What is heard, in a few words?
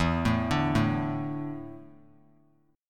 E 7th Suspended 4th; E7sus4 arpeggiated; JGuitar